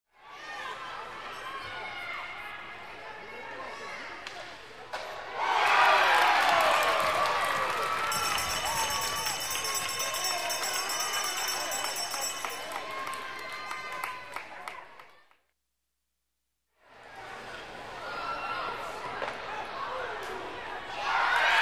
Ovations of fans, children and teenagers; standing ovation

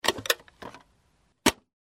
Small safe open, close

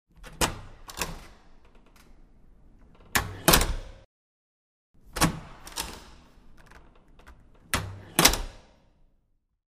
Wooden door opens, closes

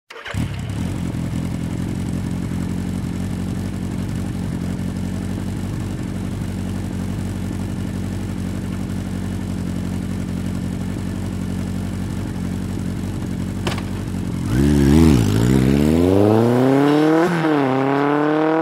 Motorcycle starts, waits, drives quickly

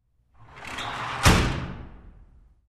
The metal compartment door closes

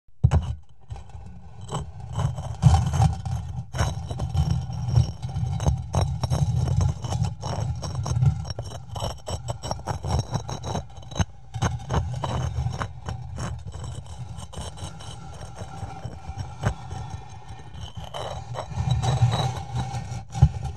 stone dragged on stone

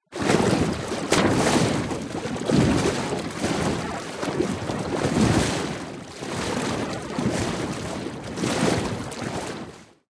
Diving, a man swims underwater

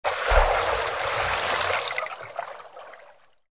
Stone falls into water (under water)

